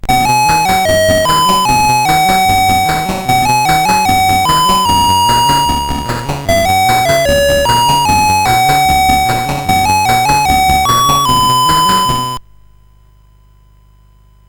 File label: CSG8580R5, GAMES; Snowman Mr Freeze